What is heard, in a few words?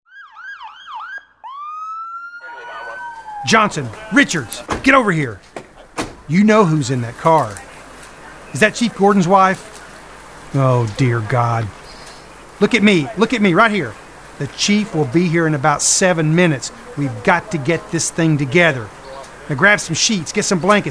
Dramatic